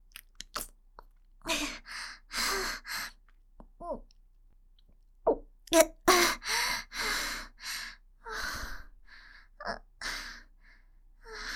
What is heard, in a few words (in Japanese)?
ごっくん→ねばっこくて荒い息; 舐め屋; ゆるふわ羊のパティシエさん; 首輪ちゃんのわけありや